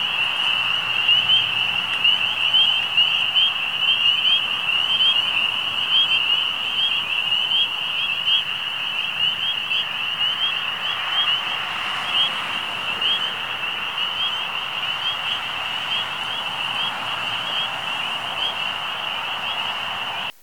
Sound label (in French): Batraciens Camions